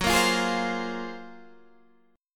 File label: Gb Major Sharp 11th